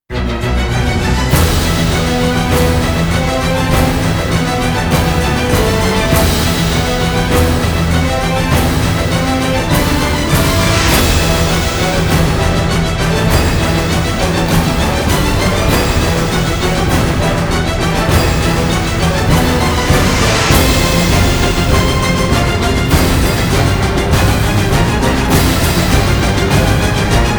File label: Skull and Crossbones (No Choir), Themes for Orchestra